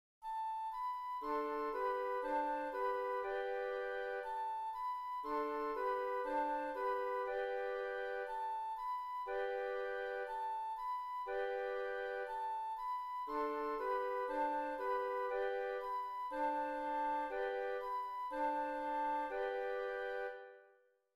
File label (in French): Carillon; Vendôme